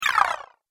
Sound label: Robotic Scan Sound, sci-fi robot sound effect; Andrew Fleming; Twistedtracks